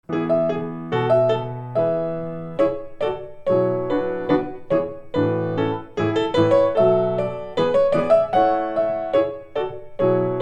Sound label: GAVOTTA Music for Ballet II pianoforte